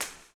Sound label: STOMPS; Spectrasonics; Vocal Planet; Blues Soul